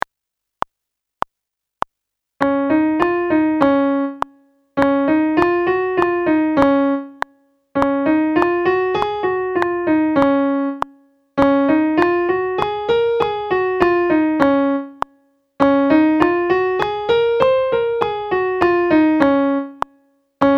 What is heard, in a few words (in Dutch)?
Toonladder C# oefening piano